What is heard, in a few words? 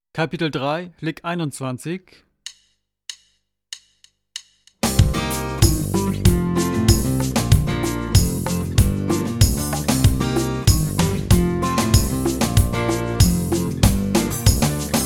Latin Style Guitar